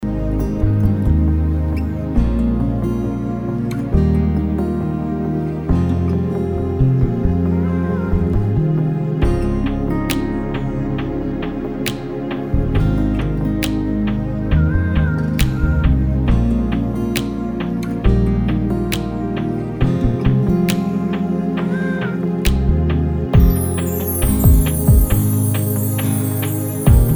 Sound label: Karaoke Song; love aaj kal